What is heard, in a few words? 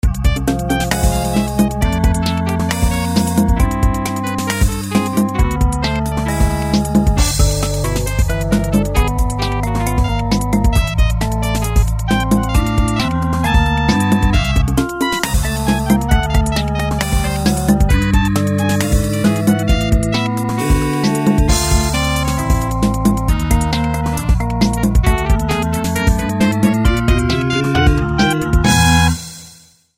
Quirky; Quirky 2